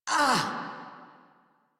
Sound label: Pain, Battle; Warframe; Grineer Catbrow Handler